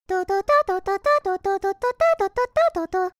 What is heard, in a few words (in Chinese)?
来电 小米; Hanser手机提示音包